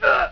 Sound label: damage; Camelot